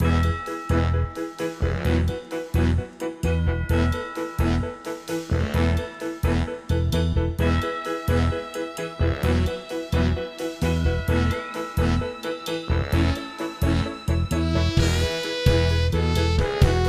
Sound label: Rewards; MTUS